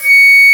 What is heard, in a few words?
Flute mf C#5; Digital Sound Factory Virtuoso